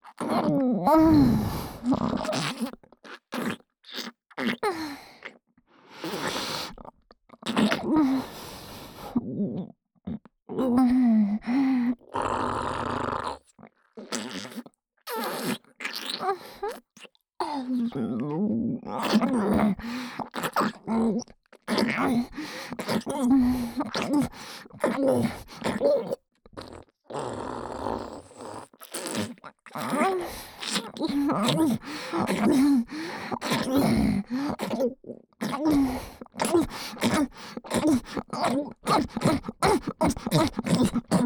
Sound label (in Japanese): 妖艶 喉奥右耳フェラ; フェラ素材